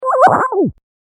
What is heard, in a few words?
Weird Electronic Squiggle, cartoon; Miscellaneous Cartoon Sound Effects; science fiction sound effect; Andrew Fleming